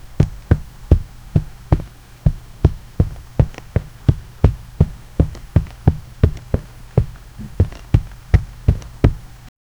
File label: a single footstep on a; fps